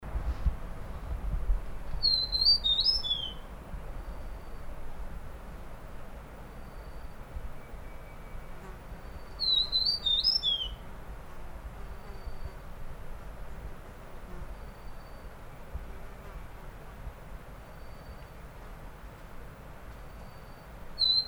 Huets Fulvetta Song, Fujian Dai Yun Shan; alcippe de huet alcippe hueti

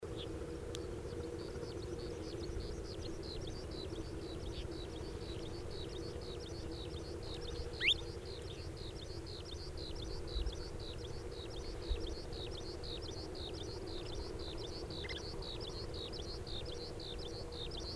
Oriental Skylark Song; alouette gulgule alauda gulgula ssp coelivox

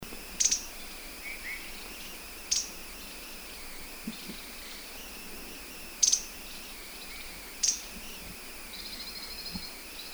Grey Bellied Tesia Call, Guangxi Da Yao Shan; tesie a sourcils jaunes tesia cyaniventer

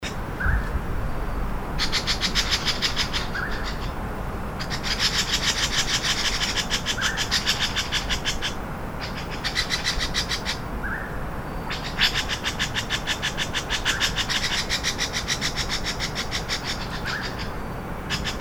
Grey Sided Scimitar Babbler Alarm Call; Cris d'alarme de Pomatorhin de Swinhoe

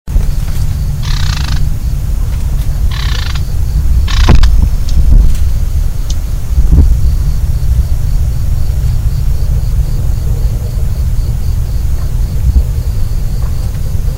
Manchurian Bush Warbler Call; bouscarle de manchourie cettia canturians

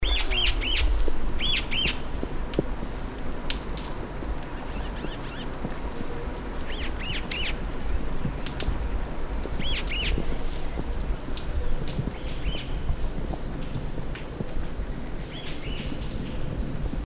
Large Cuckoo Shrike Call; echenilleur de mace coracina macei ssp rexpineti

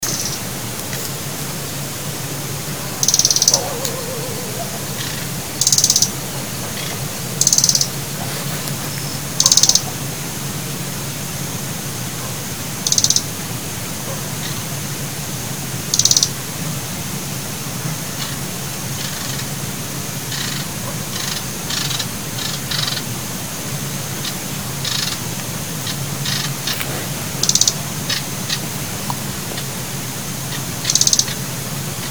Red Breasted Flycatcher Call; gobemouche nain ficedula parva; Guangdong Yangxi Xitou Iphone

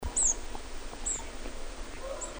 Olive Backed Pipit Call; Guangxi Shanli

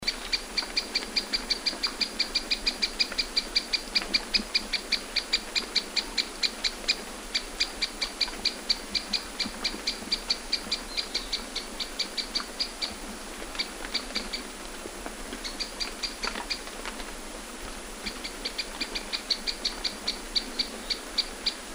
Hill Prinia Ssp Superciliaris Alarm Call; Guangxi Beishan

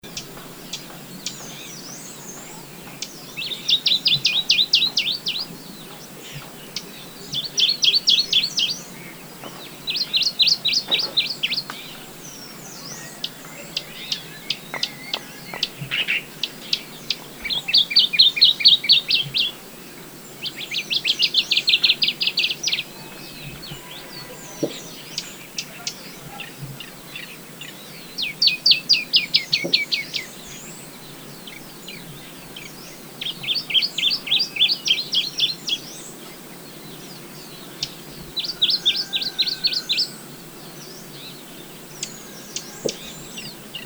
Dusky Warbler Song; pouillot brun phylloscopus fuscatus; Guangxi Shanli

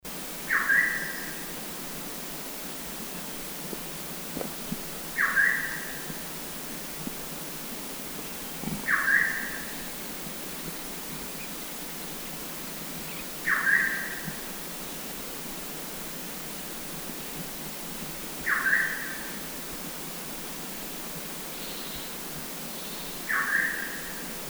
Black Streaked Scimitar Babbler Song; pomatorhin chanteur pomatorhinus gravivox ssp unknown; Guangxi Da Yao Shan

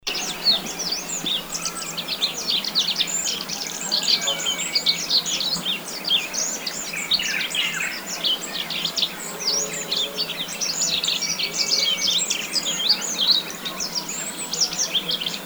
Black Faced Bunting Song; bruant masque emberiza spodocephala ssp indetermine; Guangxi Shanli

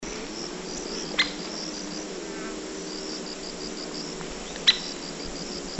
Great Spotted Woodpecker Ssp Mandarinus Call; pic epeiche dendrocopos major ssp mandarinus; Guangxi Dai Wian Near Beishan

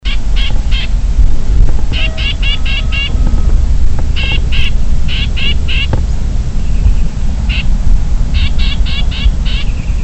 Chinese Grassbird Alarm Call; graminicole de chine graminicola striatus ssp sinicus; China Guangxi Shanli

